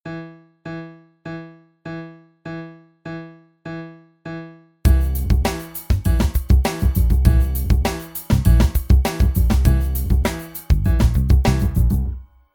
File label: Moderato